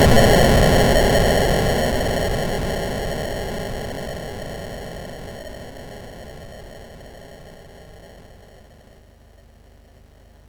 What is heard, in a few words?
Frantic, MOS6581R4